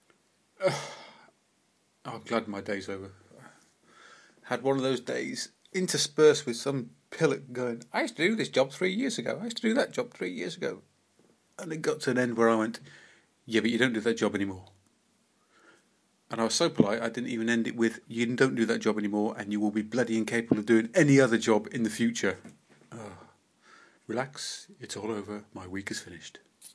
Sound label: mini moan